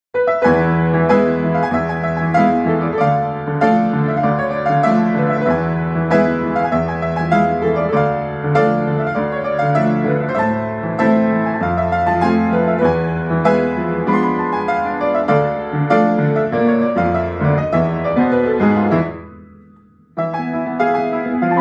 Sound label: Piano